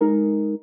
Harp Ab Eb Bb

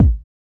kick

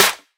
snare alt

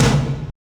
normal hitwhistle

